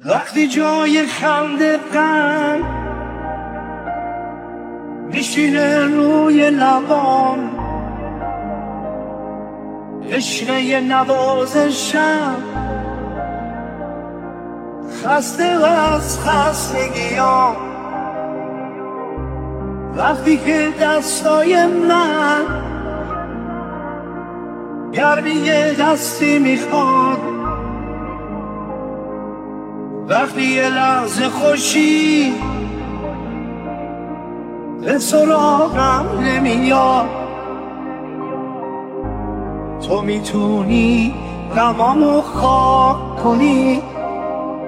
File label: Piano